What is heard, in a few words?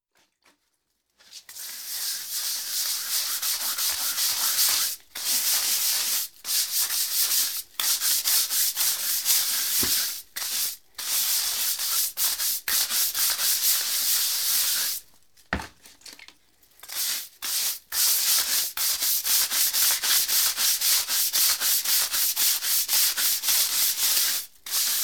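Cleaning a bathtub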